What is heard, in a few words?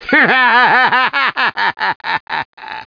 Laugh; AQ2